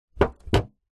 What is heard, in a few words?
metal tin lid flex bend; zapsplat